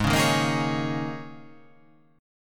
G sharp Suspended 2nd Suspended 4th G sharp, guitar